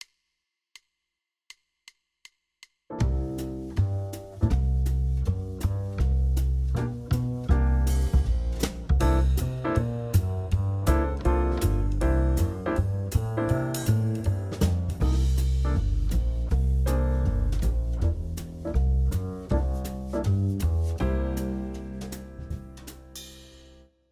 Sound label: C mixo 160; voodoo horns